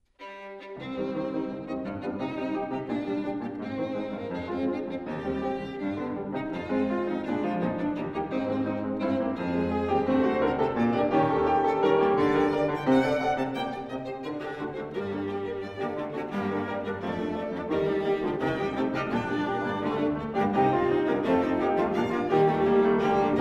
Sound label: piano quartet; foote